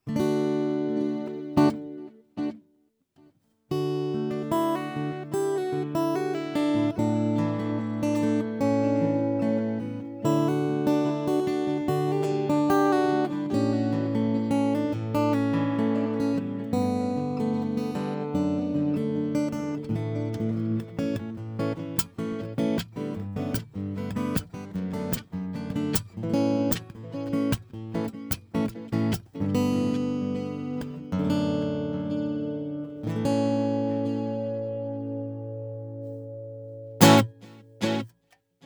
Mackie ProFX6v3 Slapback

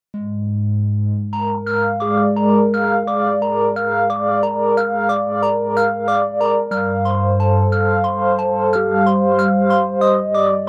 modulated Pad

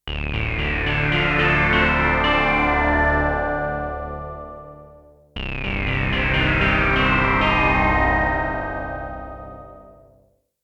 AMS dmx 15-80S Obi dry

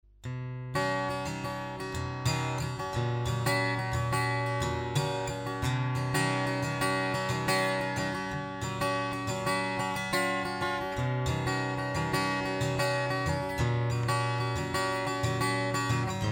114ce LTD DI Strumming

